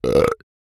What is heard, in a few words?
burp; OPENAIR; Anechoic